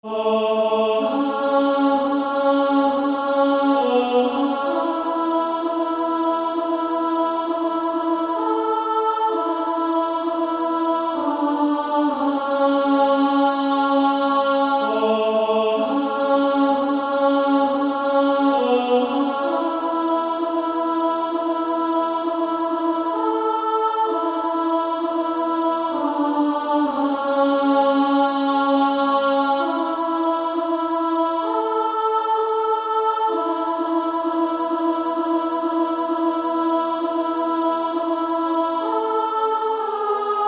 Female Choir A1

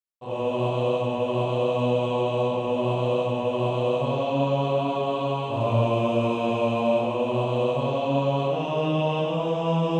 Male Choir; Bass

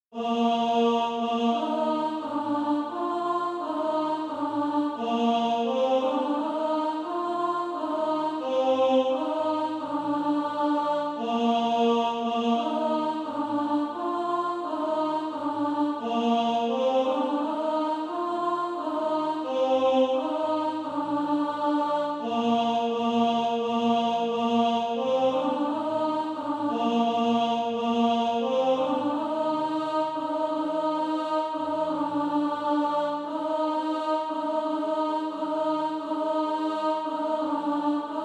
alt, SATB, Practise Music

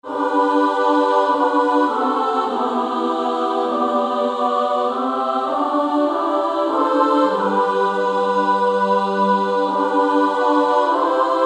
SSA

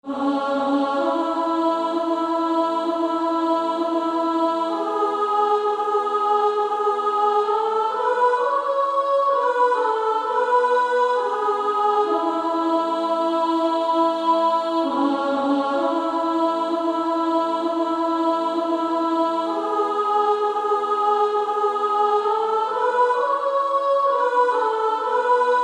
Female Choir S2

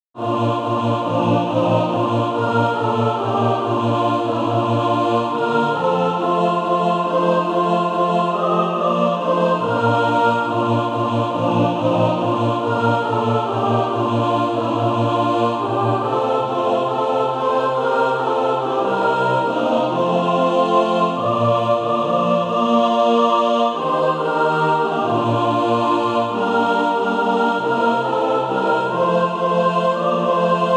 mixed

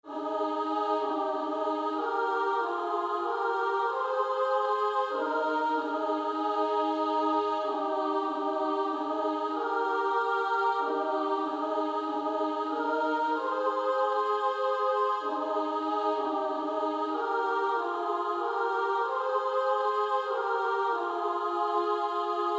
Female Choir SA

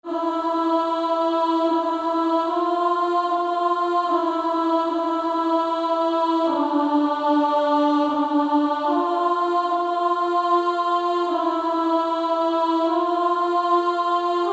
Female Choir; Low In The Grave He Lay; Alto

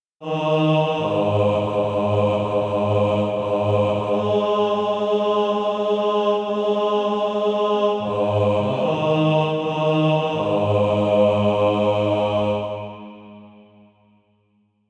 Bugle Call bas

